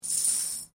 Coin Penny Multiple Move Stone; Common coins; Money; Objects

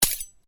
Blade Swish, Sword; Foley sounds for melee weapons; Swords; Combat; SoundEffects-Foundation1

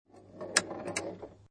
Pinball Sound Ball Roll And Hit Object Midways; Video Games

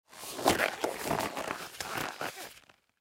Gloves Rubber Put On; Foley Gloves Rubber Put On; Dressing Sound Effects; Human

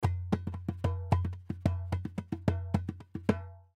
Goombay Drum, Goombay Drum Short Rhythm; Musical; Musical Goombay Drum Rhythm Roll Short; Blastwave

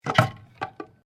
TELEPHONE OLD ROTARY BAKELITE PICKUP

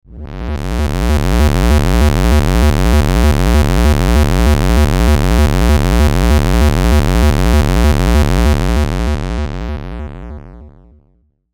Sweeper Distortion Synth; Production Element Imaging Element Accent Transition

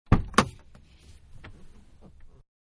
Small Car Door Open Interior; Cars (Sound Effects:Vehicles); Manhattan Production Music

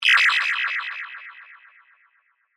Laser Beam Ray Gun, Stun; Lasers; BlastWave

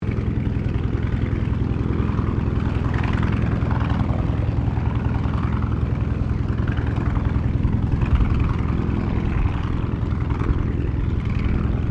AIRCRAFT T-28 TROJAN IDLE LOOP, fighter bomber; Airplanes; Vehicles

